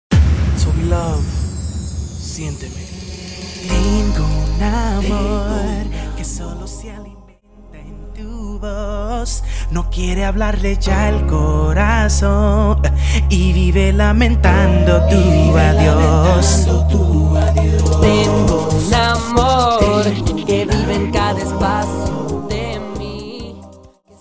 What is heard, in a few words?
Acapella Starter 134 BPM; acapella starter outro